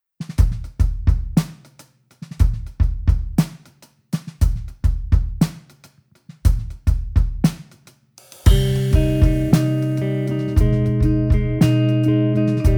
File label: Rain Cadenza